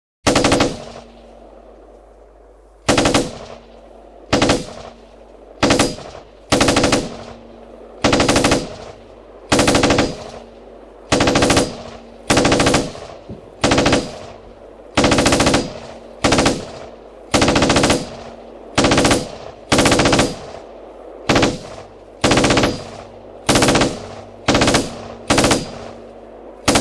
Sub machine gun - Chieftain tank target ranging (mono); dbx test